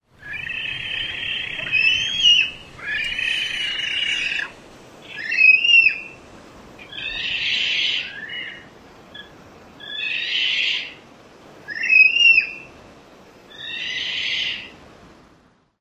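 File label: night screams, Magnificent Frigatebird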